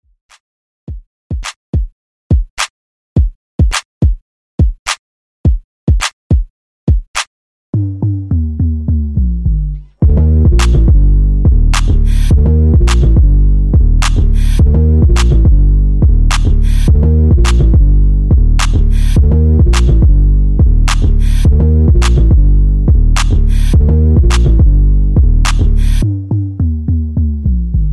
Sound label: 105 BPM